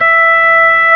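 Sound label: ORG B3 E 5, organ; Best Service Gigapack 2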